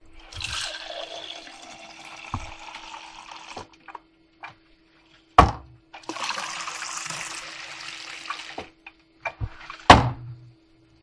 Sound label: pub pint pour; field reports